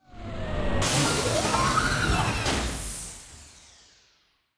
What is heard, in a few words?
space game intro door